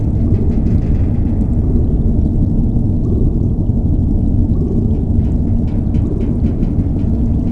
submarine run loop; Civ4

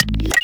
adrenaline tank filled

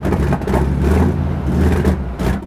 engines; World of Tanks

